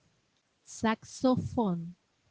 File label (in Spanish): saxofon